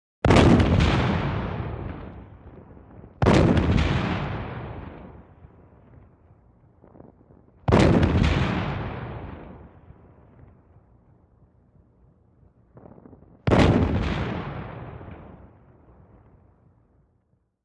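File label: Artillery Cannon Shot, modern artillery exterior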